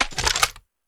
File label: grenade pistol equip; Shattered Horizon